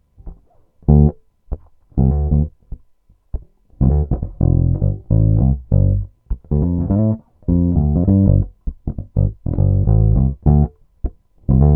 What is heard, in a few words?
Neck Fingerstyle Tone DOWN; peavey shootout audio samples